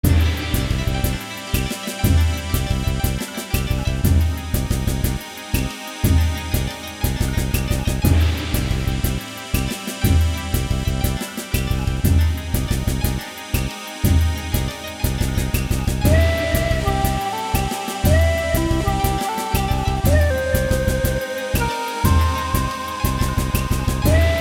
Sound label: Wind, Forest, Fire, Mountain; Waitress Paradise: Stay With Me